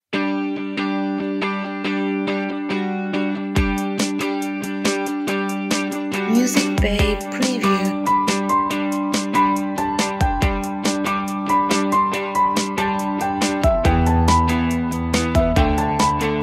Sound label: Positive Pop Rock